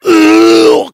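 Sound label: heavy pain critical death; voice packs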